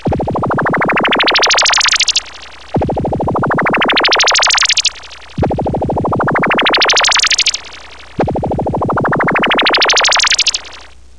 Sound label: SCIFI; Sirens of the Pleasure Dome